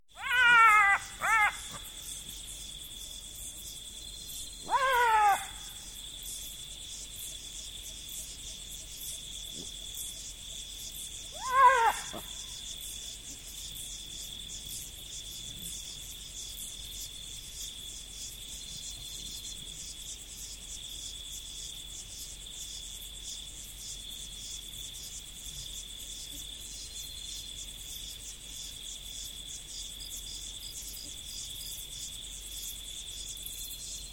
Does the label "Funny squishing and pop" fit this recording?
No